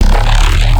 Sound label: Bass Shot; Voco; neuro; BIG5AUDIO